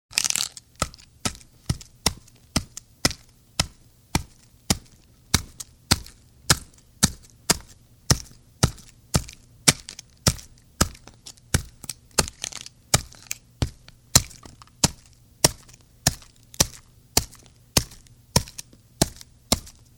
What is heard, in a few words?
Ice pick; SOUND EFFECTS1